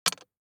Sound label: DICE on Glass, Drop, Standard, 5 Five Dice; Samples; ArticulatedSounds; DICE Sfx Party (Extended)